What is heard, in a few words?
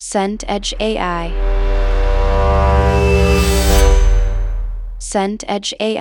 brass bed dark